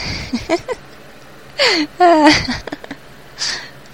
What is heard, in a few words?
goofy laugh